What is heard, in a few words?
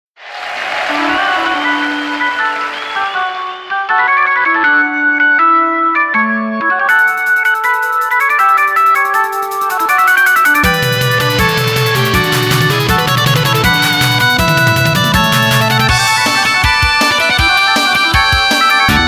fugue D major